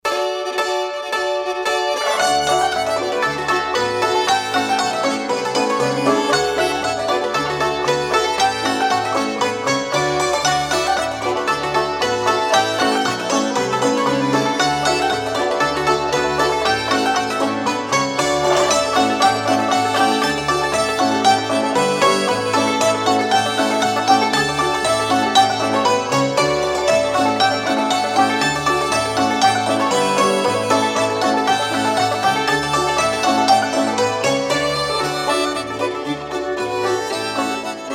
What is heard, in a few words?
Gaspe Reel, Fiddle Head Reel